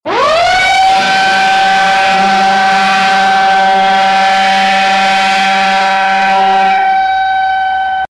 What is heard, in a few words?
tornado warning siren